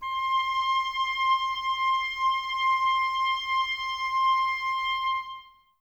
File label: OBOES C, Oboes, Wood Winds; Miroslav Vitous; Woodwind Brass Ensemble